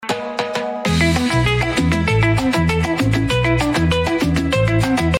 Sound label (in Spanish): Guitarra Cumbiambera